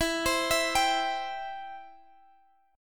B sharp Major, E; B#/E

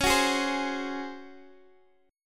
Db Major 7th Suspended 4th, Guitar, Open G

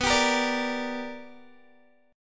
B Minor Major 7th Sharp 5th Guitar; BmM7#5 strummed

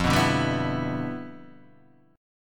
G 6th, F, chord; G6/F strummed

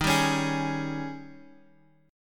D sharp 11th D sharp Guitar Half Step Down; D#11

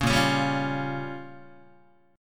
Bb Minor 6th Bb down Guitar; strummed; JGuitar

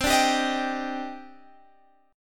Eb Major 7th C; Ebmaj7/C; strummed

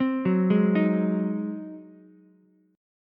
Ab 7th Ab down Ukulele; Ab7 arpeggiated; JGuitar

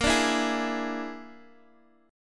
A sharp 7th Flat 5th A sharp down Guitar Open D

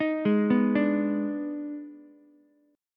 Eb Major 7th Suspended 4th Sharp 5th Eb, Ukulele; arpeggiated; 8,8,7,5; EbM7sus4#5

